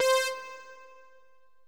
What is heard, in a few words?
jump brass